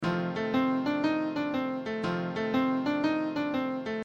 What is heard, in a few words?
Blues Subdominant